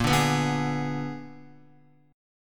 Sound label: Bb 7th Flat 9th Bb down Guitar; Bb7b9; strummed; JGuitar